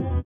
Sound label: sitar, Indiatek; Spectrasonics; Metamorphosis